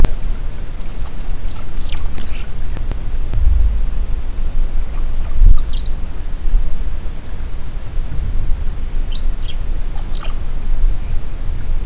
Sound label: cowbird call, Brown headed Cowbird